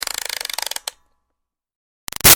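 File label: Toy Music Box Wind Up Medium; Wind Up Toys; BigRoomSound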